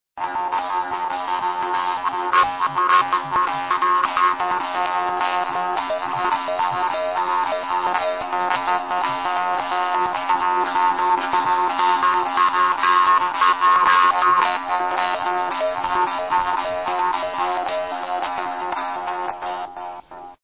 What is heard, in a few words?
khomuz